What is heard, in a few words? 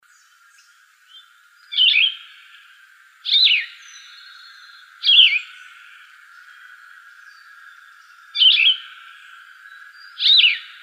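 Yellow-throated Vireo, Shrikes Vireos; YTVI; deviche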